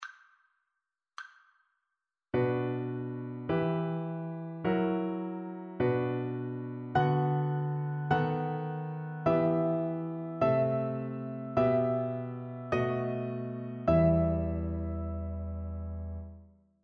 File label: harmonic dictation